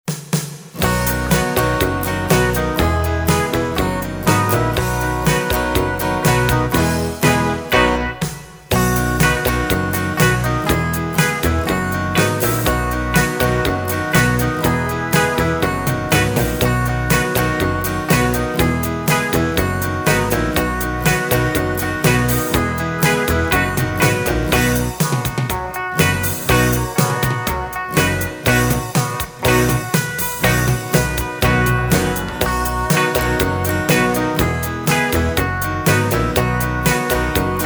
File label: music